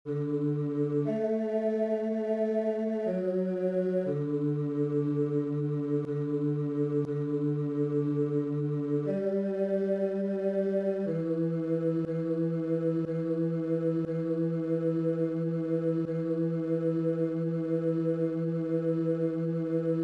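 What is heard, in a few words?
Ave Maris Stella Choeur Ténor; Monteverdi; Vespro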